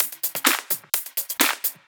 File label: Houseloops 128BPM; Vengeance Samples